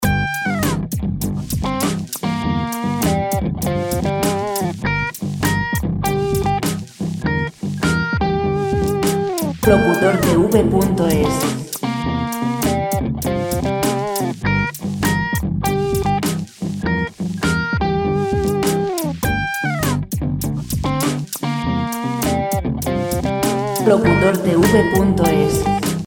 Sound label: 100 rock; Rock free music